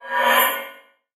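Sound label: mirror sounds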